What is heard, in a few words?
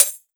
Open Hihat